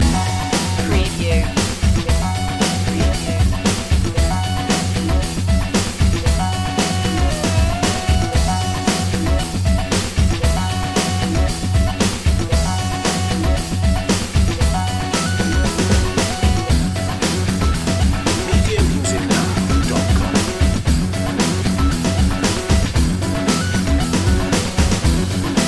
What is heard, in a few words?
rock invictus loop